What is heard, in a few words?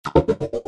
Hollow Echo